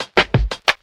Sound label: clapalong, Kick Snare; Drum loops